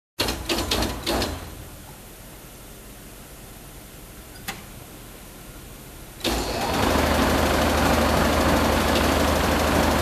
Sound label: Manufacturing Machine Running